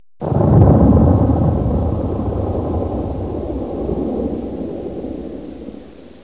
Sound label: EXPL; explosion sounds